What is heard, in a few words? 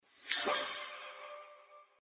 sweep Light